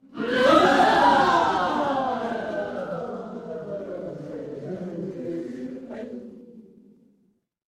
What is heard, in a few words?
crowd gasp